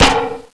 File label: garg claw